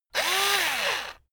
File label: electric drill screw out medium; maschinen; bohrer; soundpool